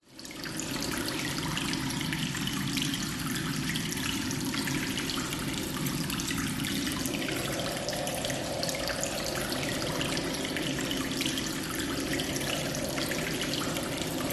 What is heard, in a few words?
cave ambience strong howling wind through tunnels water drip reverberant